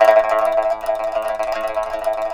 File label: PIPA; INIS - Opium